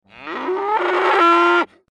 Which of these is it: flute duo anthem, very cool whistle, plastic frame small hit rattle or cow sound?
cow sound